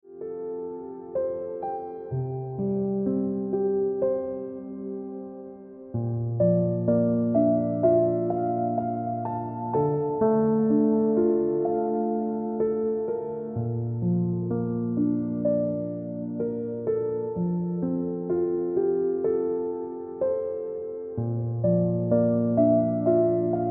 Ringtone

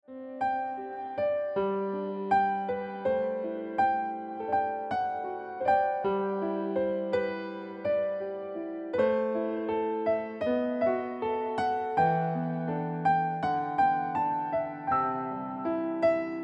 Ringtone

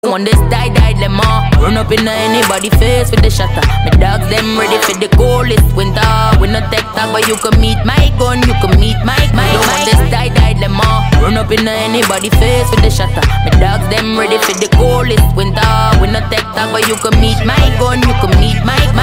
Ringtone

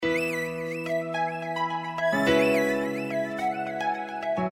Ringtone

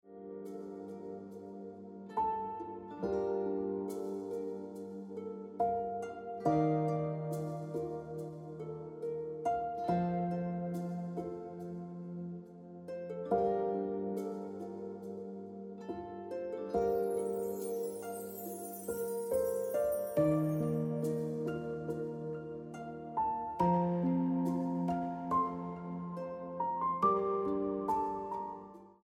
Emotional Life Ringtone Piano Music

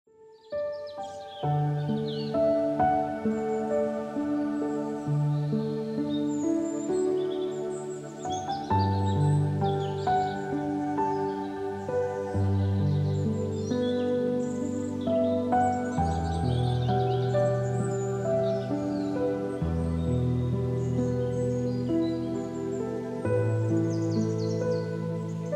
Lofty Hills Ringtone Piano Music

